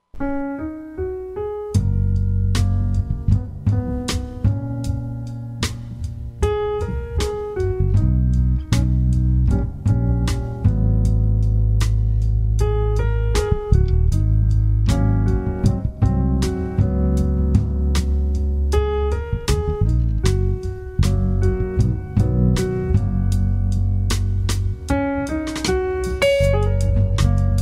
jazz

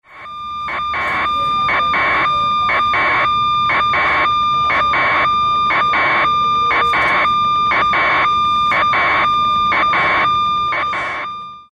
Receiver double beeps

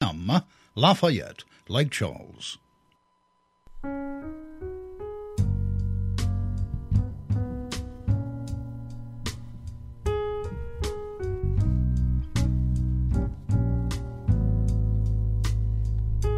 jazz

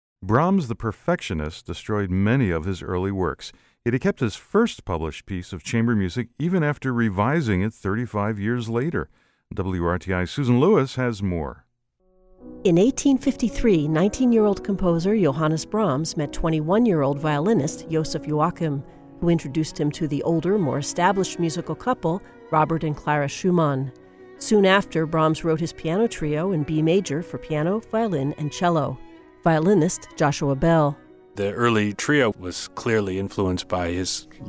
Brahms 1854 Piano Trio; Bell

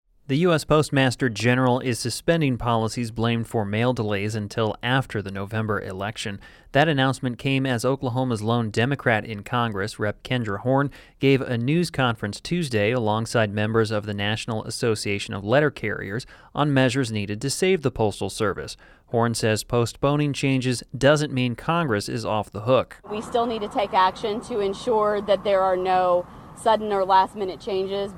horn; usps trotter

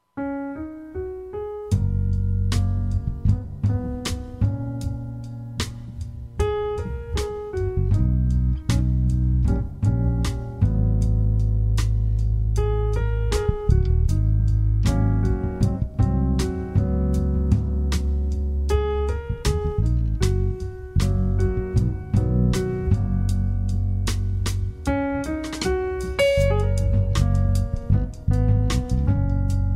jazz

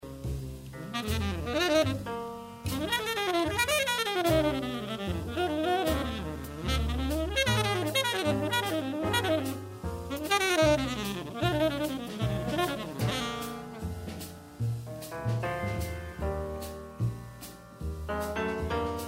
jazz

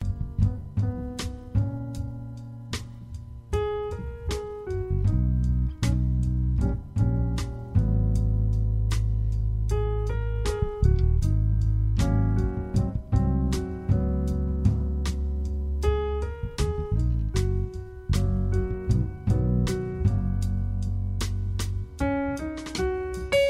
jazz

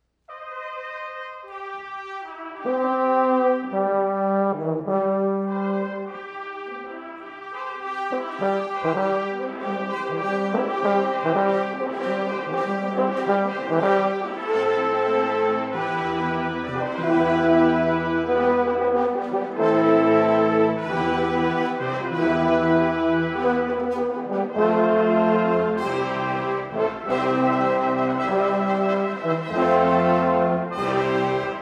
fanfare prelude; smetana; arr. decker; libusa overture

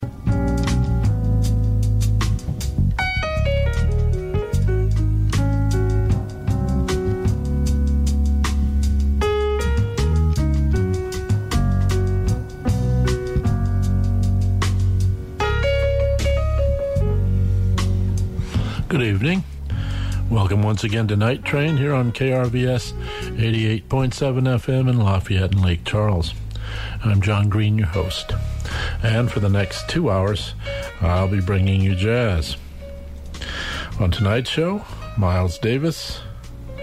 jazz

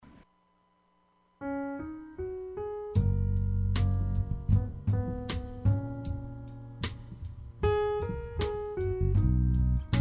jazz

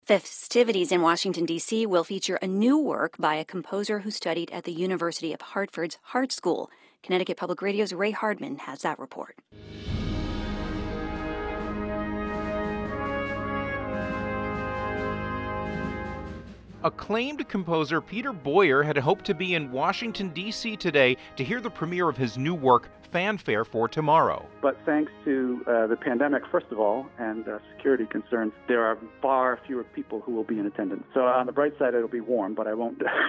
inauguration fanfare

